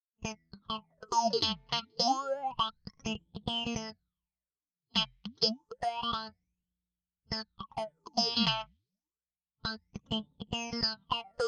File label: Strat Phase